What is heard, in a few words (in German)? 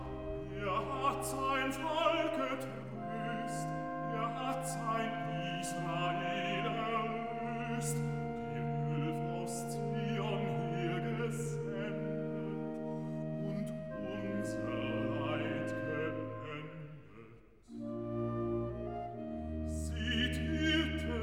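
Er hat sein Volk getröst' (Recitative); BACH; Christmas Oratorio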